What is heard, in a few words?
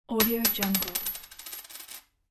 coin fall; jdproduction